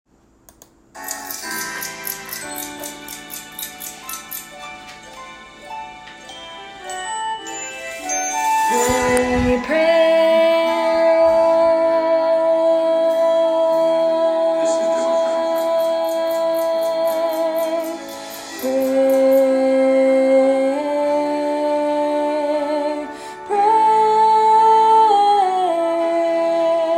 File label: Bass; Fame The Musical - Holiday Pack